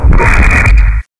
quake; ambience

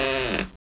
creak soft; ambience

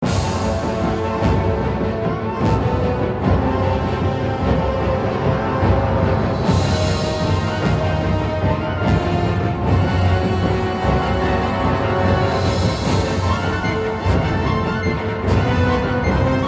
boss music

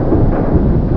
engine; rebar

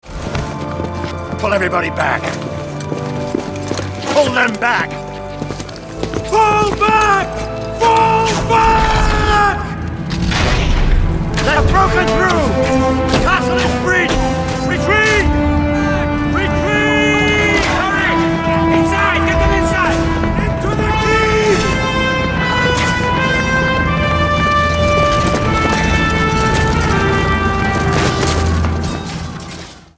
Fall Back

